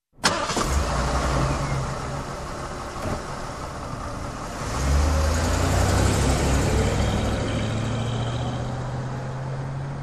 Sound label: SUV engine ignition and drive away